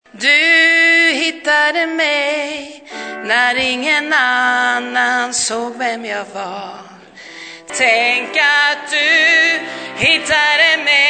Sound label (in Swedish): sopran